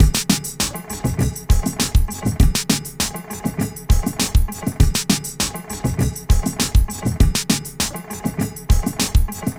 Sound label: Standard Baladi Beat; Sonic Foundry (Sony Creative Software); Myths of Technology; Composite Beats